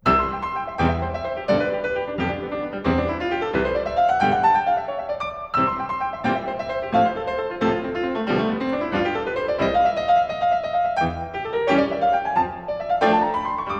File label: 8 Variations in C major for piano 4-hands; Ludwig van Beethoven - Complete Works [Brilliant Classics 100 CD Box]; on a theme by Count Waldstein; WoO 67 - Var.6